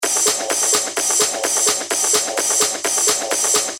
128 BPM; Puma

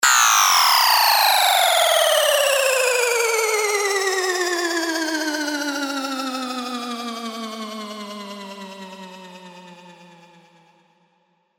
Downlifter; Spire

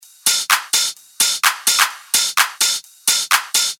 128 BPM

